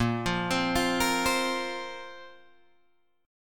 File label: A sharp Suspended 2nd, down, Guitar, Drop D